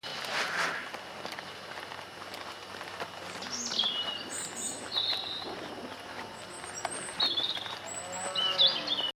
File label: More Birds; Aylesbury trip; Some quick sound bits from the Aylesbury trip.